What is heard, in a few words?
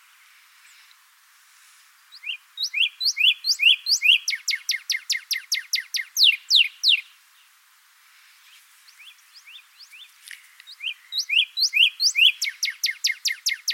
Northern Cardinal Cardinalis cardinalis